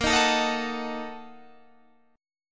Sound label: A sharp Major 7th Suspended 4th Sharp 5th, Guitar Open G